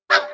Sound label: Lazer Collision; Angry Birds Space